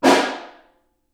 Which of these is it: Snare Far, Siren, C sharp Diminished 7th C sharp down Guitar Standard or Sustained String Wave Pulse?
Snare Far